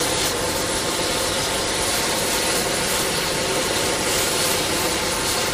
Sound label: Apache Overhead Loop, AH-64 Apache